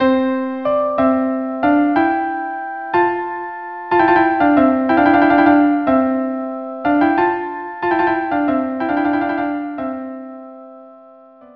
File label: pifa Violin Cello Duo